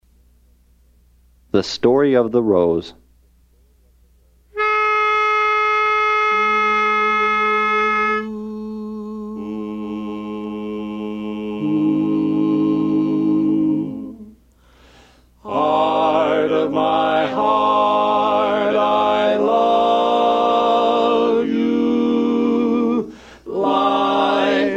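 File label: Baritone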